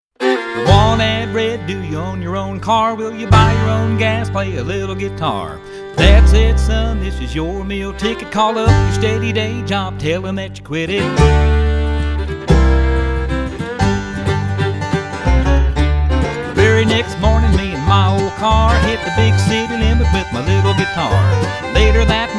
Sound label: big wide strum